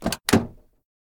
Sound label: Hummer Door Open; Still Life; windows